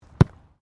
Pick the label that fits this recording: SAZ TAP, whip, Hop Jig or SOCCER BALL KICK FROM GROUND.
SOCCER BALL KICK FROM GROUND